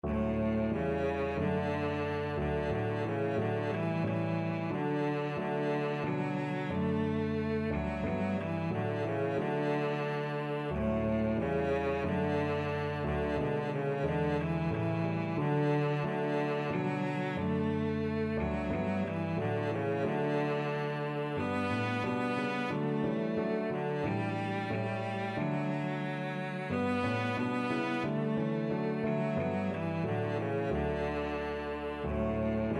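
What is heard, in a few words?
violoncello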